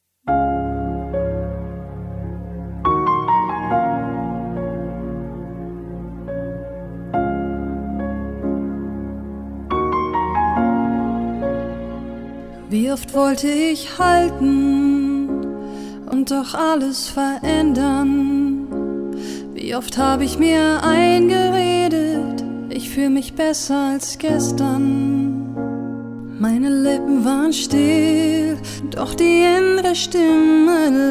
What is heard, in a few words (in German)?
Hochzeitssaengerin; Schweiz